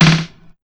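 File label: Big Beat Drumloops, roll, right; USB Soundscan vol.25; AKAI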